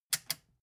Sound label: metal button click power on fast